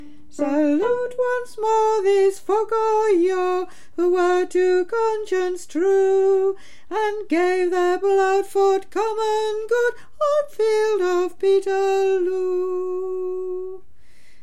Chorus Ten; Peterloo

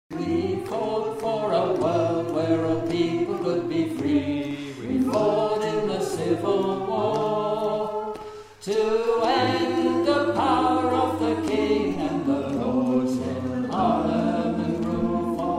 Tenor

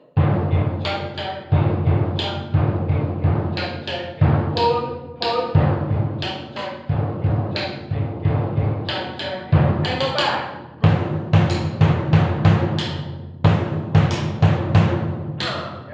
samba Break Again

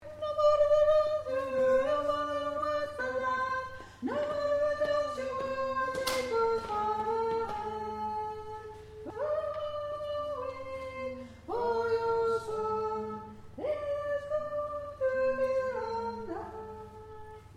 Tenor

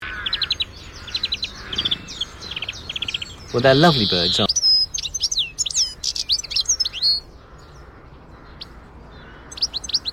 goldfinch

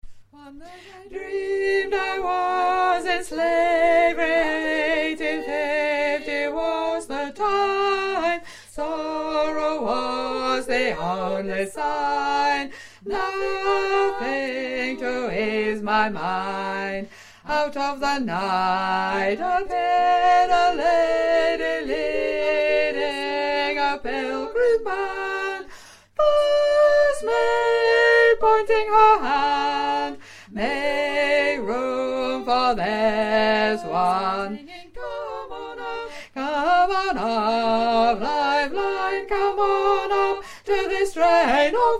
mix bass

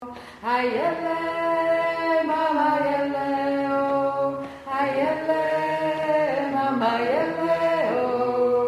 Tenor

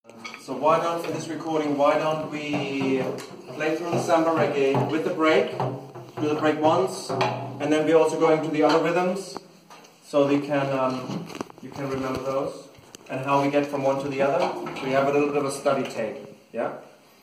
Intro samba reggae

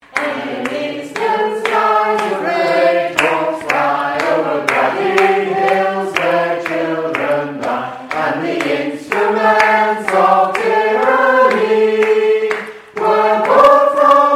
verse rhythm

